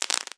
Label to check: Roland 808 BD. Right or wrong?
Wrong